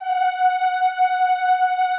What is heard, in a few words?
EEH F#4, Extended Classical Choir; Best Service